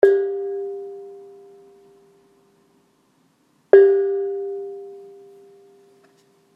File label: gong